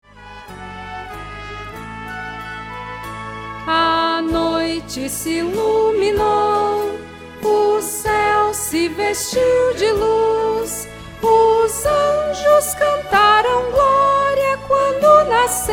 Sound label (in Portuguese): soprano